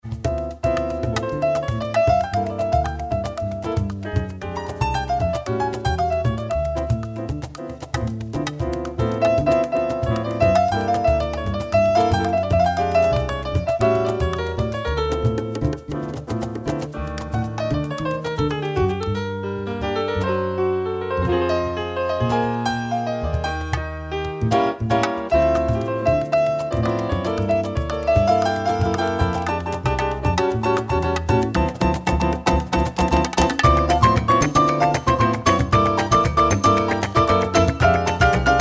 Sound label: Rumba, flamenco